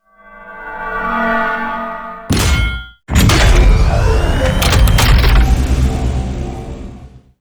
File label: battery insert; cinematic; sentinel